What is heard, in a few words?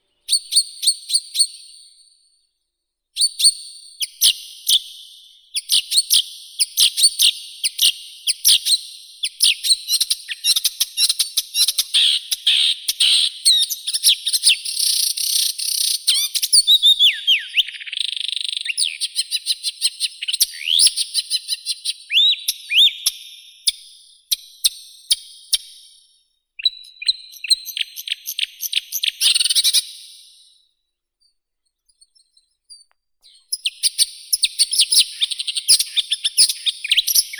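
marsh warbler, Acrocephalus palustris; kamishovka bolotnaya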